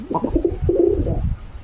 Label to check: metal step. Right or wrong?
Wrong